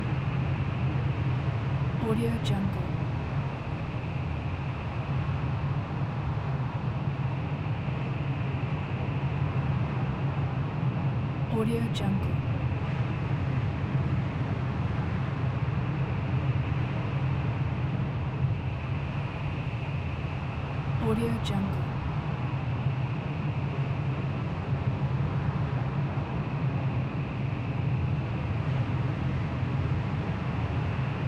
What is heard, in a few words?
scifi radioactive wind ambience loop